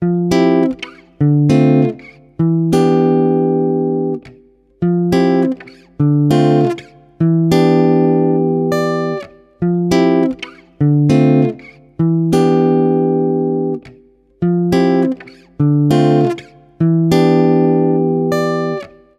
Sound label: Guitar 100 BPM (F7maj), Guitar Loops; Kin; Ghosthack; Guitar Loops & Shots